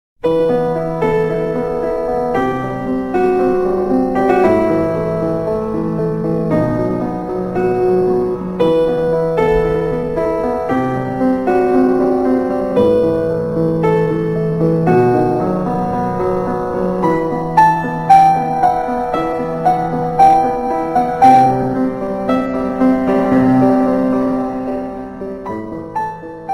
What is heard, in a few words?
piano; twilight